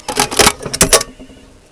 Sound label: open, m60